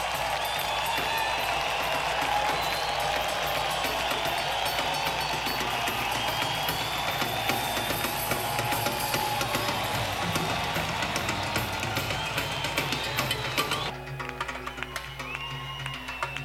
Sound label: Drums; senn421